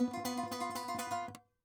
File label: saz